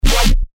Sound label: bass shot B; Galaga; revealed bass shots vol 3